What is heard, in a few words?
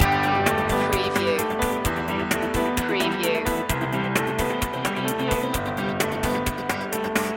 loop breakdown